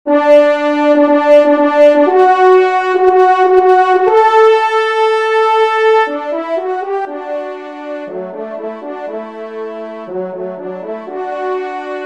trompe; L'Andalouse; J. Amelin

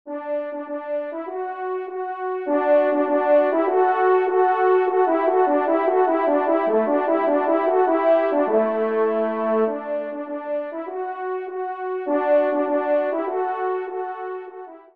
Tambourin Do Maj; 1e Tambourin; Corrette

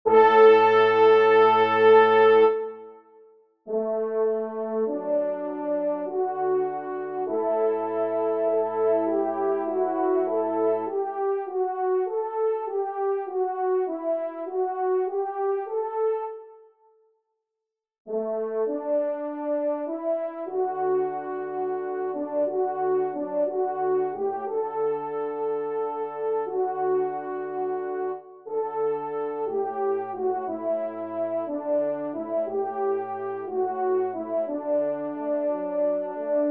trompe; Hymne à L'Aurore; Jules Cantin

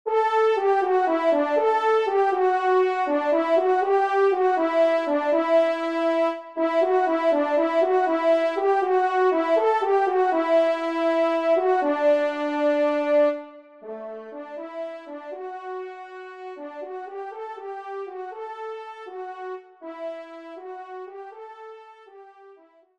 Scotte Solo, trompe; Solo Ton Simple; La Scotte; Ville de Lyon; Rhone Alpes

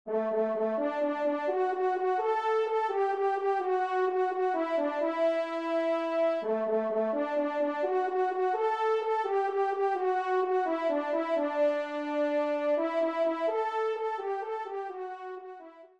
trompe; La Meffray; Trompe Solo (TS); Bouton de l'Éq. Par Monts et et Vallons / Éq. d'Halatte; Oise

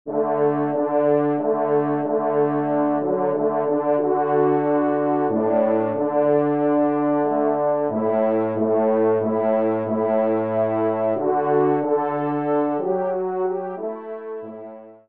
BASS; Elevation

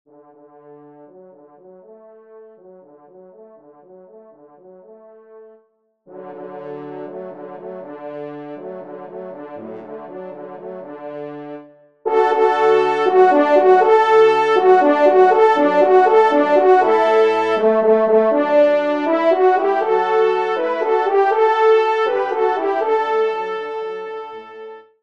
trompe solo; Le Tyrol

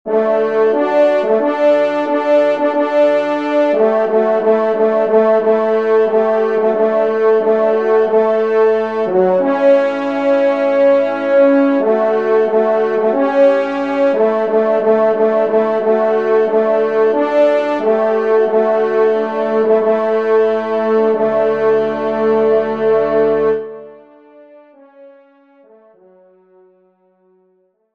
trompe; Offertoire; Messe de Rochard

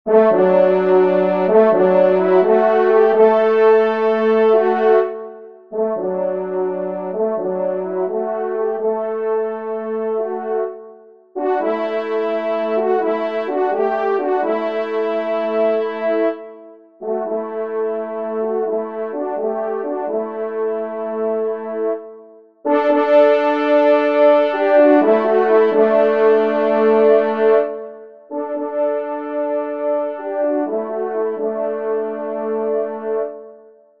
Valse des Chasseurs, trompe; P Bouillon; 3e Trompe; Auteur : Paul Bouillon

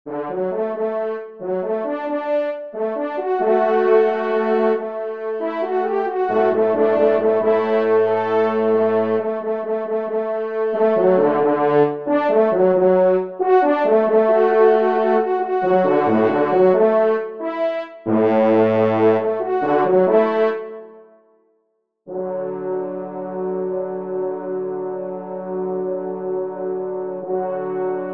trompe; Vieux Charmont; Andrieu

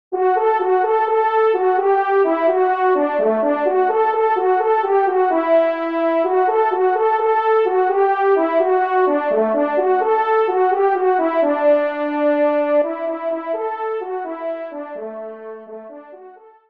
trompe; Souvenir de Valencay; Indre

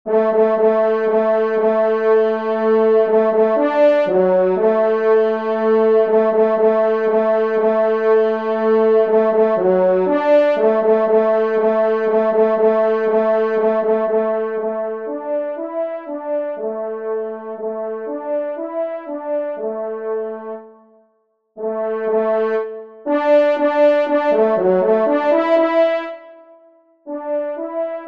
mazurka, trompe; Loisirs du Chasseur 9

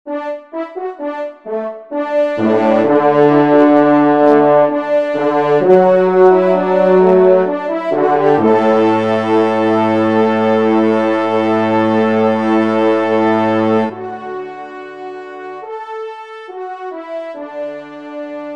trompe; La Chasse Joyeuse; J Pattein

